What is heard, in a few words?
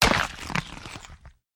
crossbow hit